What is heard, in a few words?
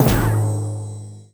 Fx Shadow Death; the princess and the frog; Swamp